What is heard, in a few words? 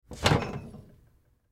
Cabinet Door Open Glass; Furniture; Household; Cabinet Doors; Blastwave